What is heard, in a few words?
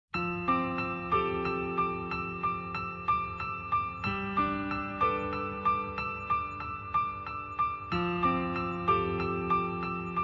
Rain